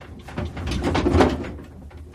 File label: Metal Clunks Cart; carts